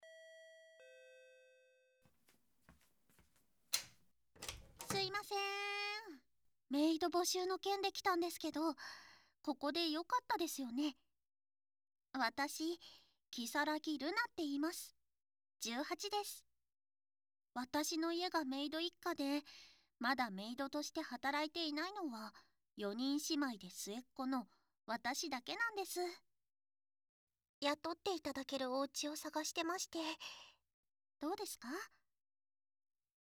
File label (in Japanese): 新米メイドさんとの出会い(キス、耳舐め手コキ)